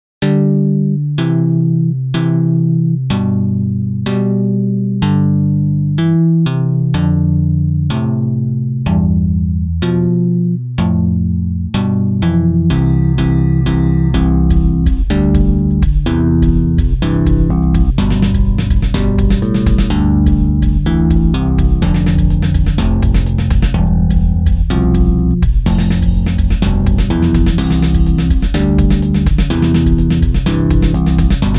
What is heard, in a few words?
music box